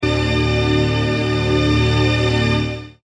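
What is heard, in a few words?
Kurzweil Pad D 120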